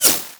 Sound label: Laser Impact Metal; Warframe; Weapons; Bullet Impacts